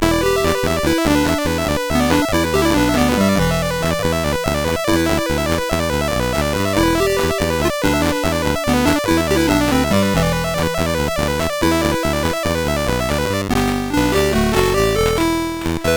sid CSG 8580R5

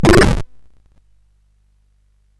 sid CSG8580R5; Gremlins 2-The New Batch

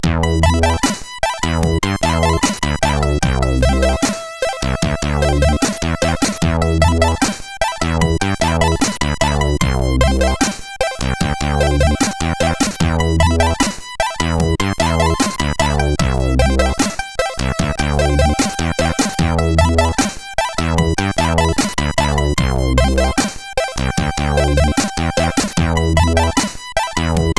sid CSG8580R5

